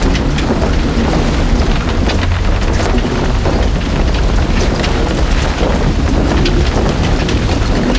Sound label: large player boat; DAoC